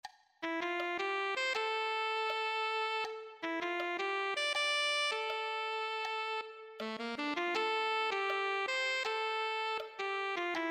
alto saxophone

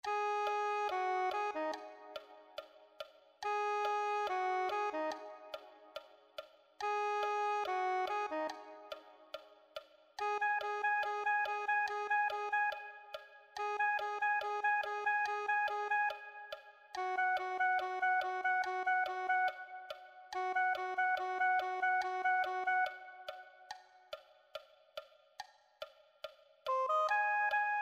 soprano saxophone

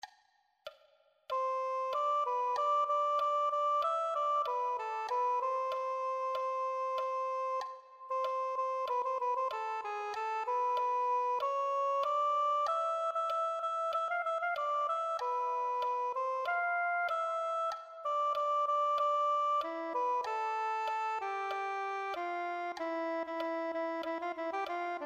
soprano saxophone

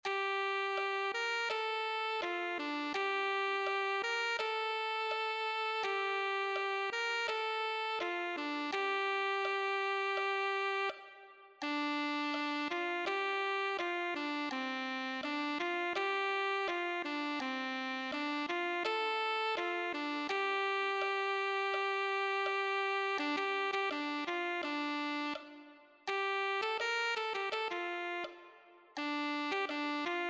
alto saxophone

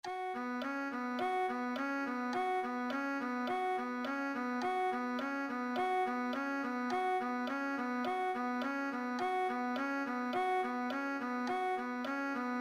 cello

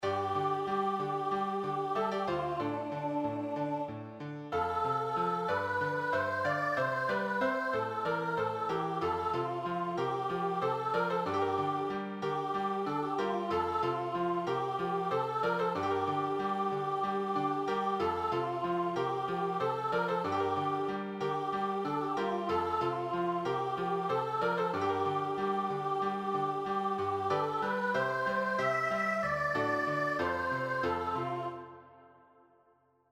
accompaniment, piano

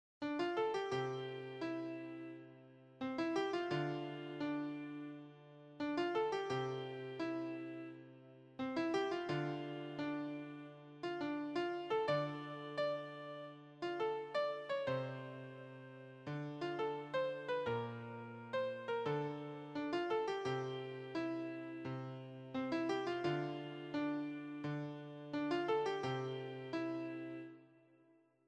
beginner solo sheet, piano

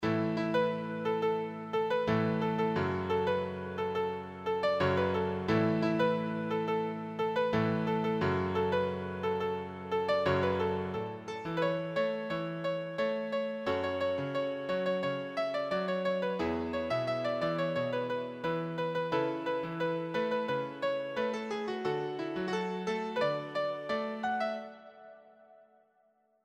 kudmayi accompaniment, piano

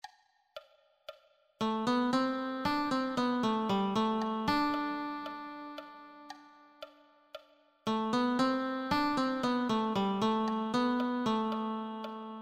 guitar

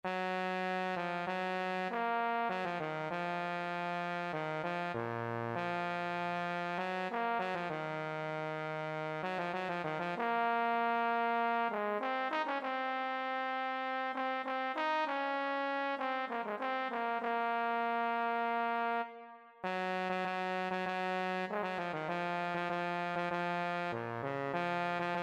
euphonium

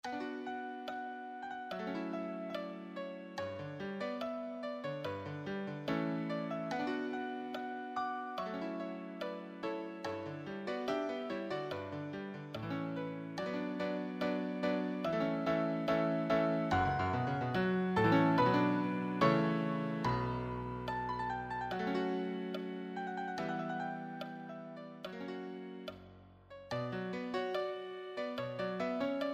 advanced solo, piano

